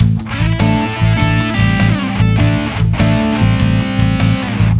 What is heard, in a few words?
Classic Rock, ringtones; Google; NewWave Labs